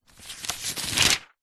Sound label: household book phone directory yellow page turn; zapsplat